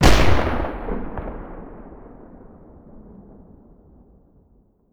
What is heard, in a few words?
tank fire